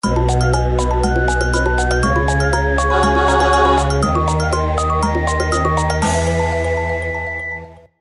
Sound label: Level Jingle; The Jungle; Bubble Bash 2